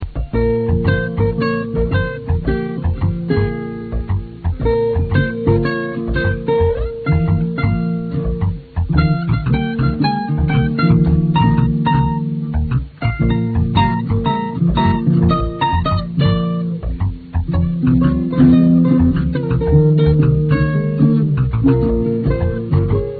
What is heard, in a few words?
blues